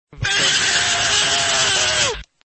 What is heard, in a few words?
silent scream; torchlight